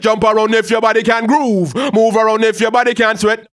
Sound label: HAL RAGGAM, RAGGA-MUFF A maj